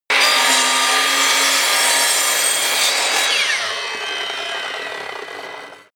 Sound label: table saw on load